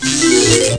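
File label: power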